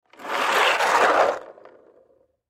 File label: Cart Wood Drag Up, Cart Drag Upside Down Wheel Spin; Foley; Rolling; Objects; Blastwave